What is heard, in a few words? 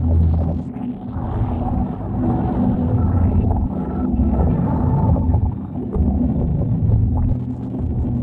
atmos; ゆめ2っき